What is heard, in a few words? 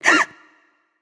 nv breath; smite; awilix